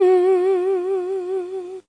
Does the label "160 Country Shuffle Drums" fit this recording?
No